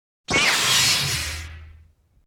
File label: Lightsaber On; Lukes Lightsaber